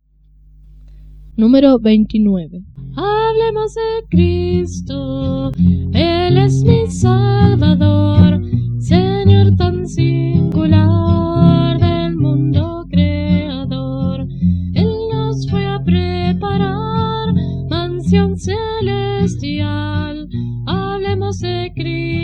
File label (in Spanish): Coro, himnario niños